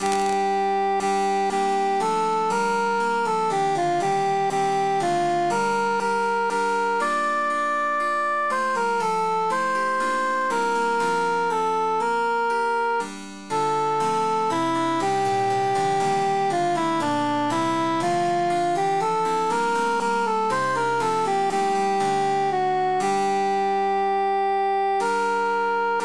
cantus emphasized

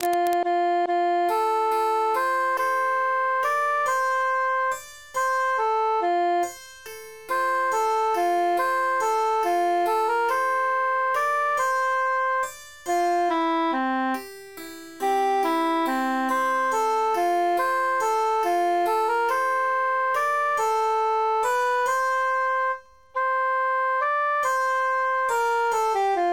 cantus emphasized; in dulci jubilo

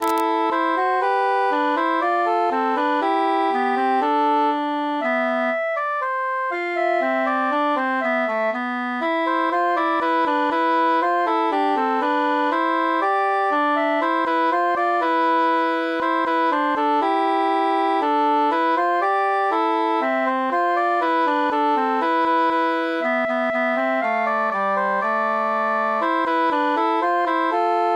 transposed bassus mute; plaude

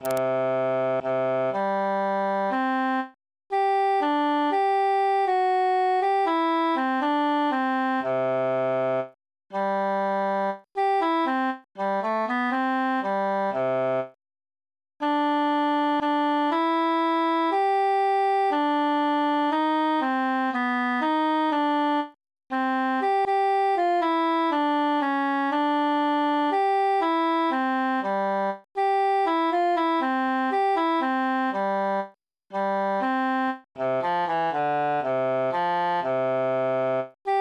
contratenor solo; se la face

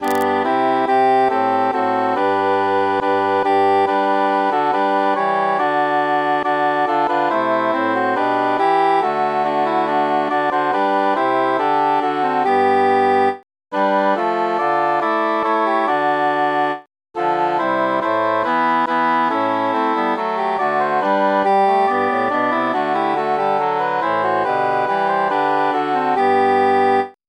tenor mute; now is the month of maying

